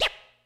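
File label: HIPHOP KIT, DRUMS; Kid Nepro; Elite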